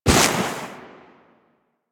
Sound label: Step Snow, Footsteps